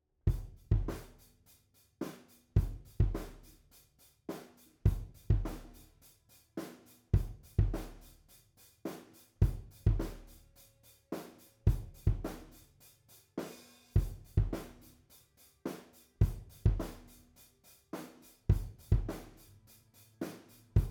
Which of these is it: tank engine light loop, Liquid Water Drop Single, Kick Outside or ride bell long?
Kick Outside